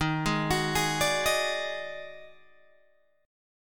D sharp Minor Major 7th Double Flat 5th D sharp Guitar Open G